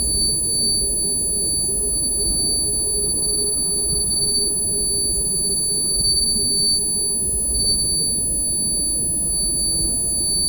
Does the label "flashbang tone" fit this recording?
Yes